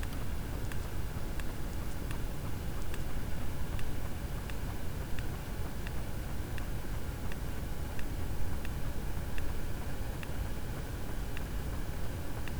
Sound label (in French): cachalot positif, Physeter Macrocephalus